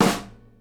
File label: SNARE, Live Snares; E-MU Producer Series Vol. 8 – Platinum Phatt